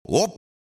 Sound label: snar, percussion and foley